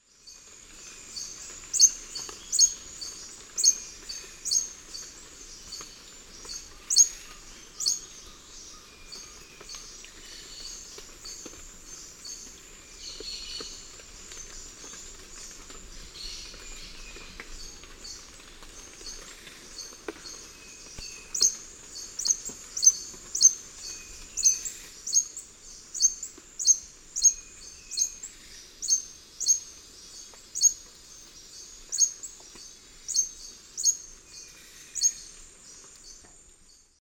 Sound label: Phaethornis malaris, songs at lek; S. America; Eastern Lowlands